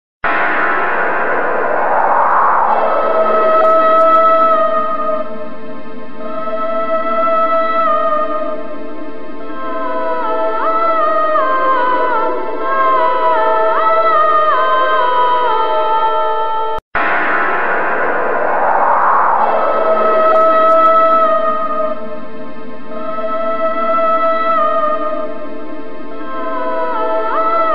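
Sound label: Horror Sound Ghost Ringtone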